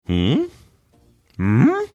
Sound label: mmm hmmmh